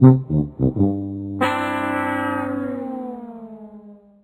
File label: sad horns